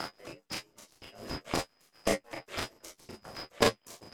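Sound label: rhythm loops, 116 bpm; Rarefaction - Poke in The Ear With A Sharp Stick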